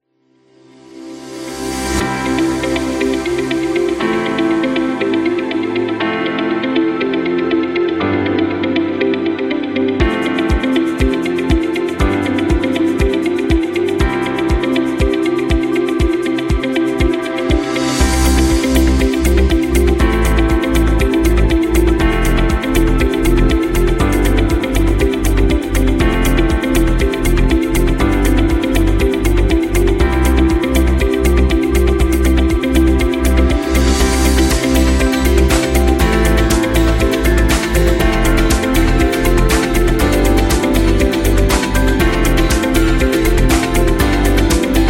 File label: Elevator